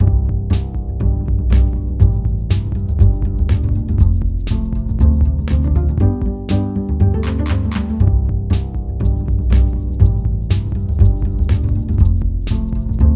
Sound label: jazz riff